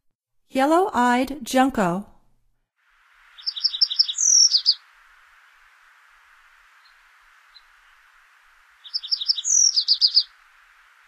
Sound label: Yellow-eyed Junco, Songs of Birds of North America; Stokes